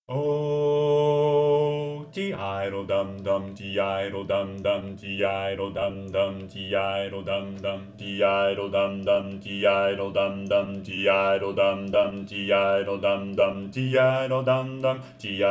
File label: bass; spring show